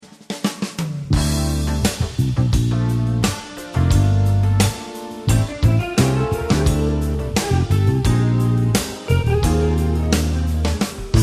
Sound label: Sixteenth Note Feel